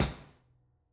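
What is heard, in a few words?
Cl Hat, closed hat; Grime; 135bpm; Zero-G Sound Sense Grime Scene; Construction Kits; A Positive Vibe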